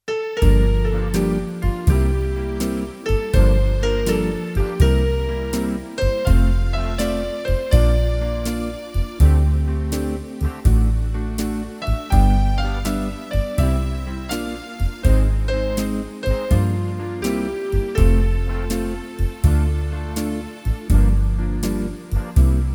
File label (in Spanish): organo